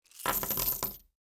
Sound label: coins to table